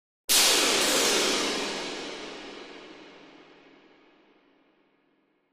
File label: Cymbal Shrt Cacophon